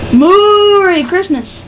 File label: moo